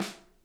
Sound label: SNARE, Interactive Drum Kits; Sonic Reality